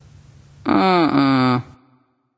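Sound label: disagree, female; smite; voicepack; hera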